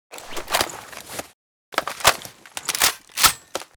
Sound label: saiga12 reload; STALZONE